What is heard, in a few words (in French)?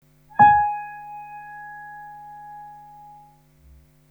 piano; pianoReconsMP